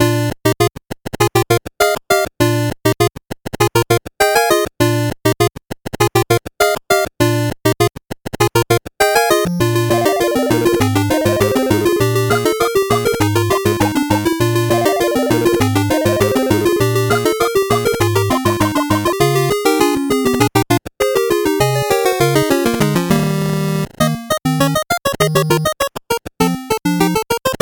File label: 8bit bgm fast